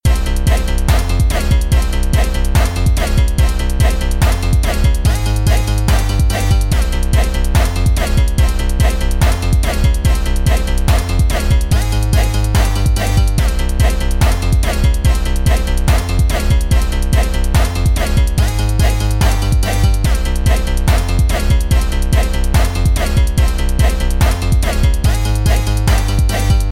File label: Hype; Ragdoll Universe; Emote